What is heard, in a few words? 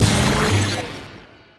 Stinger; Sound Effects Bible